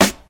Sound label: 2000s short snare drum g key; snare drum samples